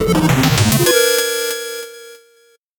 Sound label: 8bit jingle fast